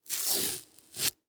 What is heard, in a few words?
Horror Scary Skin Tear